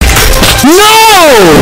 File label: wheezing and screaming no